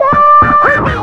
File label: hit brass; BRASS KIT; AKAI